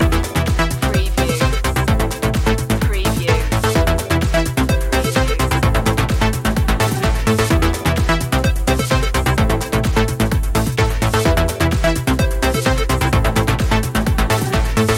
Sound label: malfunction loop